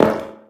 pipe step; Golden Crayon Sounds

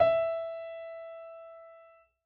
piano e; Golden Crayon Sounds

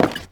plywood step; Original TK Sounds

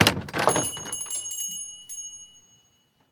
door open; Golden Crayon Sounds

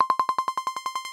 level urgent; iOS Sounds

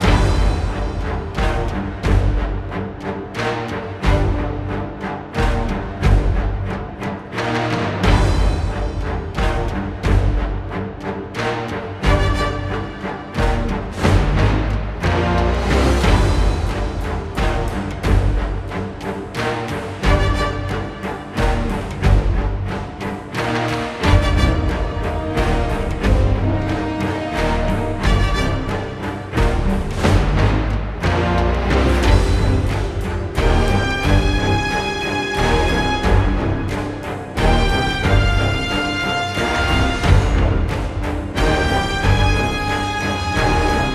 master stadium; Manamon Music; Manamon 2

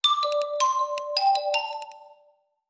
News Flash; iOS 27

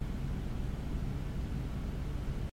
room tone; Golden Crayon Sounds

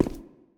cave grounds step; Original TK Sounds

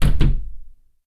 door close; Golden Crayon Sounds

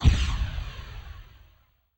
wp appear dist; Original TK Sounds

